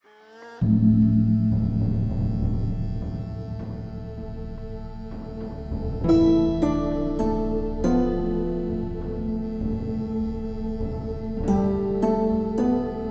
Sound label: Loop Neutral; Game of Thrones: A Telltale Games Series